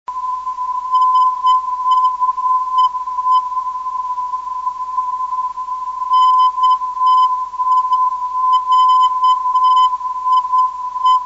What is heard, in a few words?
318.86khz, ndb; ntie-1